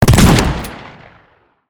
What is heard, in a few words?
shotgun; teardown ps5 windows xbox series xs gamerip 2022